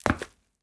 Boot FootStep; Nova Sound

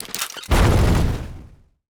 Explosive Automatic M-21; Nova Sound

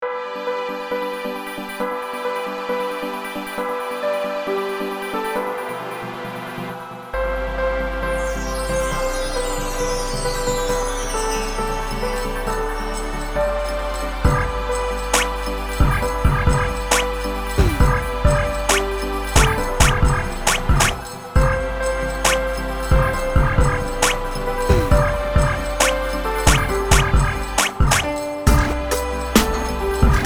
Jingle Bells Arcade

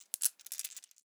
9mm Rounds Bullets Ready For Reload; Nova Sound

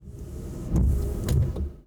Car Windshield Wiper Shift; Nova Sound

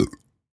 Burp Belch Foley; Nova Sound

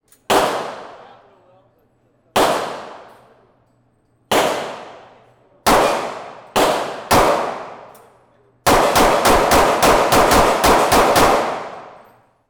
Assault Rifle Rapid Fire Shoot Out; Nova Sound

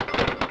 Dirty Coin; Nova Sound

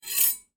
Knife Blade Unshifte; Nova Sound

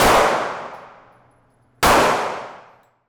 Lethal Gun Shots; Nova Sound

